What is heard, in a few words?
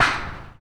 Playground Fence, percussion; Third Ward; Emancipation Park; Houston